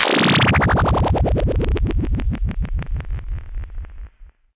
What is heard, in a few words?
Sweeps Down